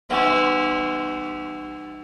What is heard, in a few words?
bell chime